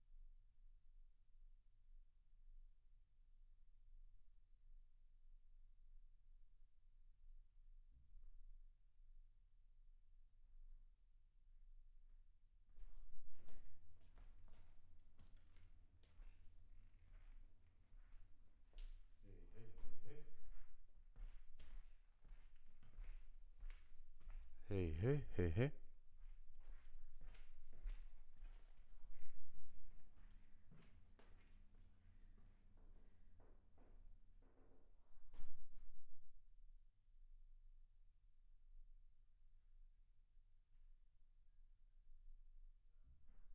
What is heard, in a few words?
MAIN VOC